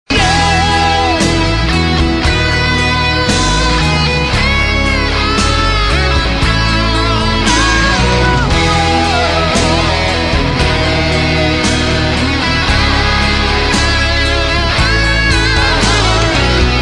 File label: Giant Guitar